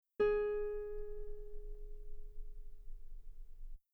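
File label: guitar G sharp 4 very long piano normal; bananenkeyboard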